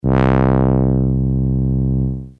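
filter, ENSONIQ SQ-80 Digitally Controlled Filter (with manual Q); Next you can hear the filter open and close with low Q.; Notice how the sound it more muffed.; four pole analogue low pass filter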